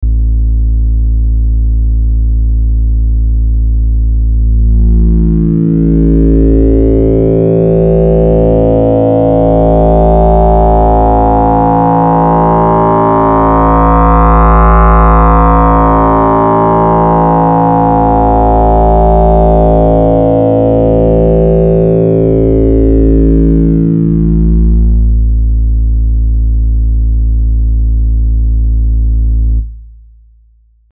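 Buchla Easel V Complex Oscillator Pure then Timbre Mod; Arturia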